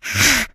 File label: become alert, infected